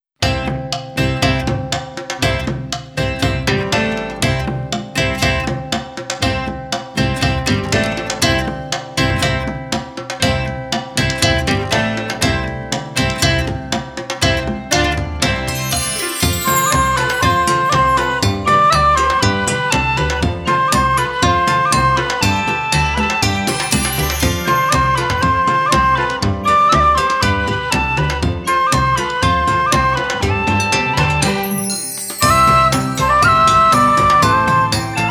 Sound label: Excavate Polka; atelier ayesha alchemist of the ground of dusk original soundtrack